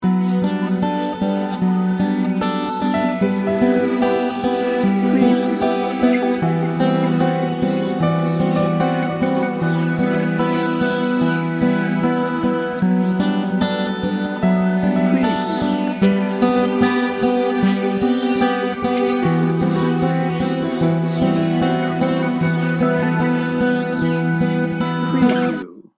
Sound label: dreamy serene loop no drums